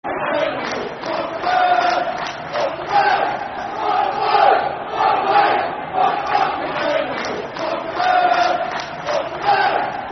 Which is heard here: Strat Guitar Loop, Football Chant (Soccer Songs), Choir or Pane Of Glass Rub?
Football Chant (Soccer Songs)